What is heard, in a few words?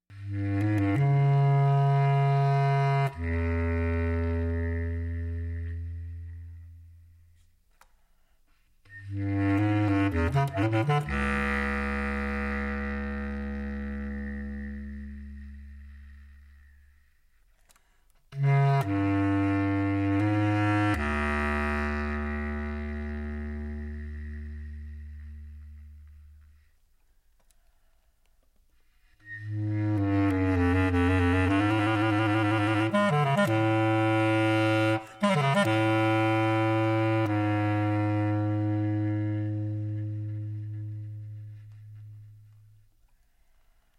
quartet music opening; San Francisco Chronicled; bass; clarinet; cello; percussion